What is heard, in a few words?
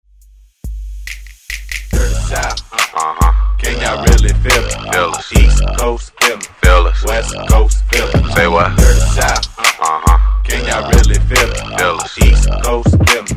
hiphop redrum